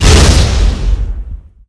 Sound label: explosions; hl2; impact